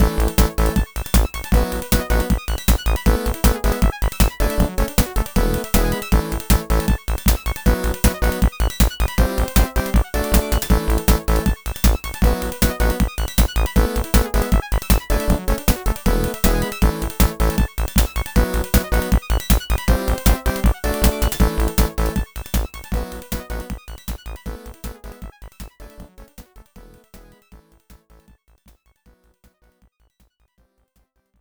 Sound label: Spraying Champagne; World Circuit; IBM PC AT